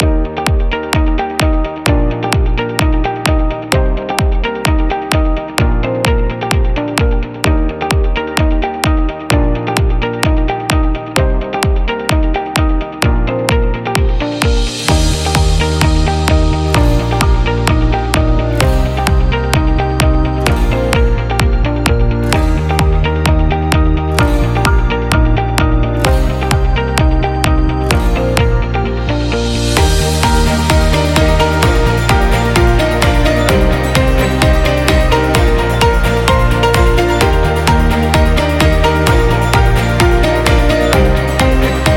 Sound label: Corporate Background Music